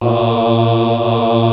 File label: choir